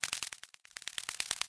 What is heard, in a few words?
Synchro Idle; Cut the Rope: Time Travel